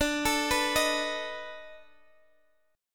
B 7th D; B7/D; arpeggiated

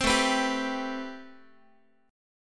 A Minor 9th Guitar; Am9; strummed; chordshape; 15,17,14,17,x,x

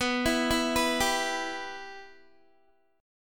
B Suspended 4th Sharp 5th, Guitar; arpeggiated; 4F,4A,5C,5G,5C,6E; 18,19,16,16,19,x

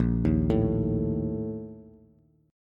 A sharp Major 7th Suspended 4th, Bass; arpeggiated; JGuitar

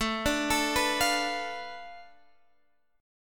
B Minor 7th Flat 5th A

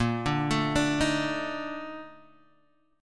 A sharp Major 11th A sharp Guitar Open G

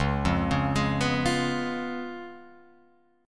Db 7th Db down Guitar Open F; Db7 arpeggiated; JGuitar

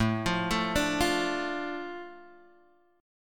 A Suspended 4th Sharp 5th; arpeggiated; {X 0 3 2 3 1}

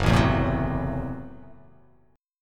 F sharp Minor 7th Flat 5th, guitar chord; strummed; JGuitar

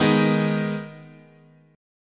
Eb Suspended 4th Sharp 5th, chord

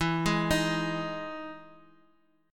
E Major 7th E down

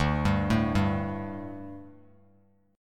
D Suspended 4th D, Bass Standard; arpeggiated

